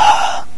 snow breath; blizzard spawn

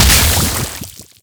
antlion shoot; zoochosis; parasite titan

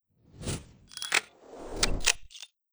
Grabpack Switch Hands; grabpack upgraded; pp3

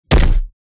ground punch clown; zoochosis; warthog

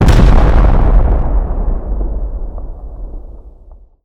rocket explosion; blind mace; UNDEAD

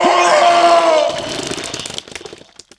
vomit blood; skibidi trash

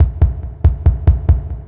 KICK HORROR; sprunki orange pack

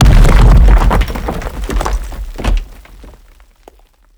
rock impact big; skibidi critical dumpster; Skibidi OCTOPUS

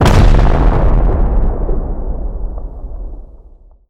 rocket explosion; undead toilet

